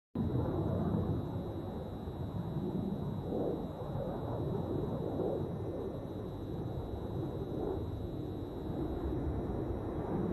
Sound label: HESA Saeqeh; جنگنده هسا صاعقه